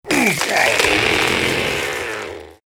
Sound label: Treant Death